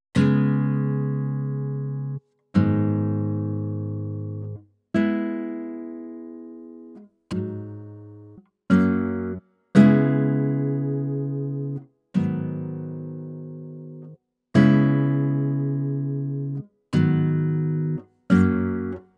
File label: 100 melody loop afro guitar G-min; radar